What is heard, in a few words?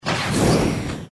jet pack launch; Danger Mouse - Ultimate